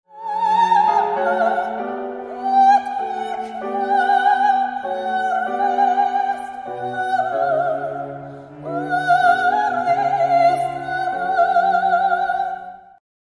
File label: Classical